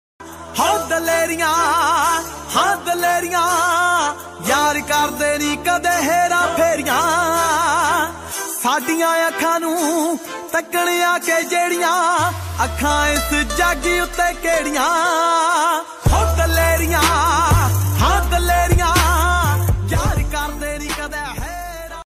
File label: Dance Punjabi Ringtone